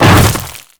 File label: Hammer Boss Step Ice, footsteps; Warframe; Grineer Hammer Boss